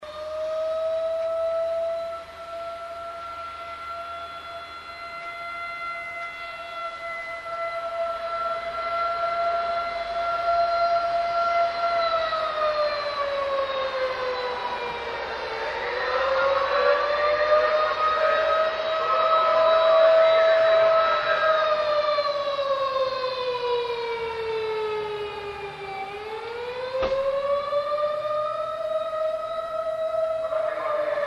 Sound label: kyuukyu siren syoubou; kyuukyu-okuri; isikifumei; gantan